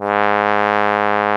G#2, BRASS; AKAI S-Series CD-ROM Sound Library VOL-8

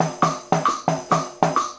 Tambourine and Wood Block; Combo

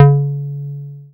BAS SH-101; Best Service Gigapack 2

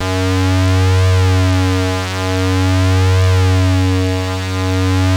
BROOMSTICK; Masterbits; Vintage Voltage

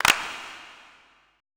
CLAPS

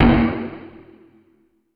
hard drums; 90 sSampleCDs; Crypton - DrumBase5000

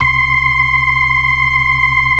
organ; Keith Emerson Signature Series

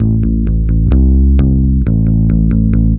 bass, Roots Funk; USB Soundscan vol.41; AKAI

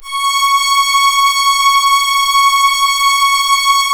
C#, strings; Big Fish Audio

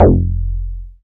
BAS FM DX-7

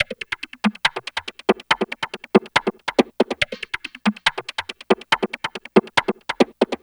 Filter Hats, Percussion; Electronic Point Blank

